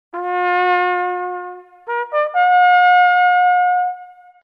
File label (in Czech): pozor si dej, troubeni